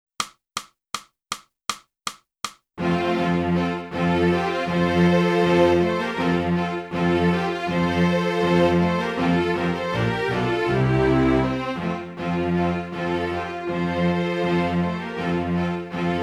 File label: flute; Boosey Woodwind Method: Flute (Book 1)